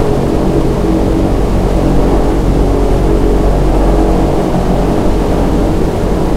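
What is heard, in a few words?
b17 cockpit left, engines; War Thunder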